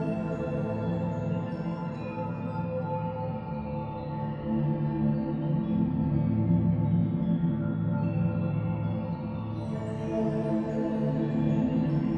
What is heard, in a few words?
Intro Ambience